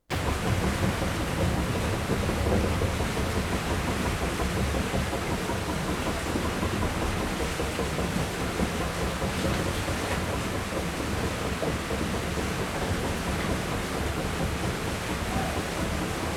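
Wooden water wheel and water, behind wheel, beside wheel (nice texture), from water spill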